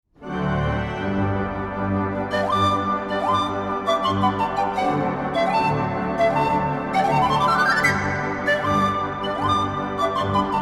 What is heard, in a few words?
Polka; Tritsch Tratsch